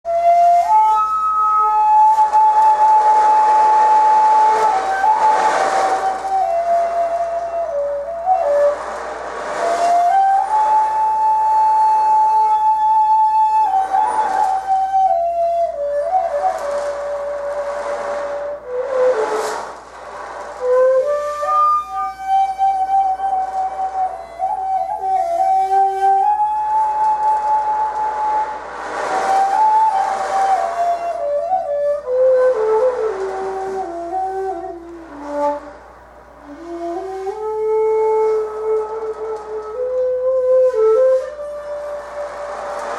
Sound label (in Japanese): 民謡の名曲､江差追分を元に「波」の音をいれています。; 尺八; いかに尺八の音色が波や木々の葉のざわめき、すなわち自然音に近いかということが実感できます。; 小学校・音楽鑑賞コンサート; 貝塚市葛城小学校